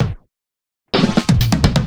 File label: Fills 128BPM, Clubsounds